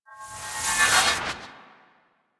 Melee Heavy Attack Large Charge, Weapons Melee Whooshes; Warframe; Heavy Attacks